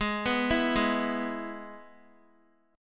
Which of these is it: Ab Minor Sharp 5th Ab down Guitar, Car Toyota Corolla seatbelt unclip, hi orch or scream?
Ab Minor Sharp 5th Ab down Guitar